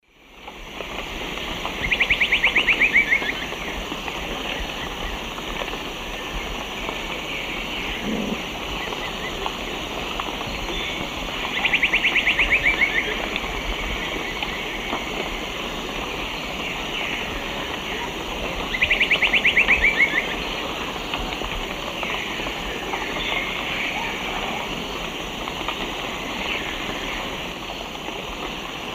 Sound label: Dendrocolaptes certhia, song; S. America; Eastern Lowlands